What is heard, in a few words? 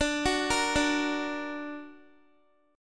D Augmented D down Guitar; D+ arpeggiated; tuning 4E,4A,5D,5G,5C,6F